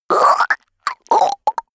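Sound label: gag, body sounds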